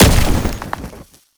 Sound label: Hit; Warframe; Uriel; Infernalis